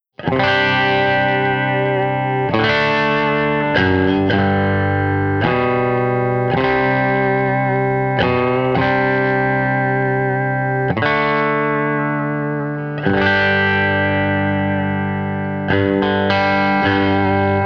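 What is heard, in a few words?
Country Guitar, Chorus 1; Digidesign; Pro Tools Essential Loops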